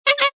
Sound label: Cartoon Clown Horn, Classic nose squeeze, funny horn.; Comedy/Cartoon; Funny Sounds